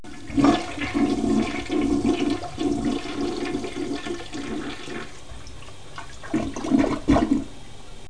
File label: THE FLUSH; Spanish Net